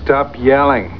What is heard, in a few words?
yelling